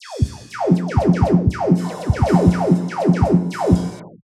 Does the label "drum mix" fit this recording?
Yes